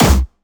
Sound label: Attack Noize, Jumpstyle Kick; Bassdrums; Jumpstyle Multis; vengeance essential club sounds-3; VEC3 Bassdrums